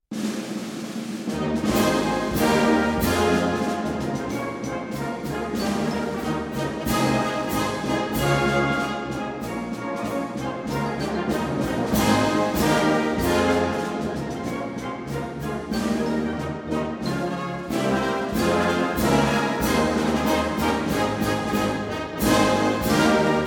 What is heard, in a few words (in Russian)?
Юбилейный встречный марш, оркестр; Адмиралтейский оркестр Лен.ВМБ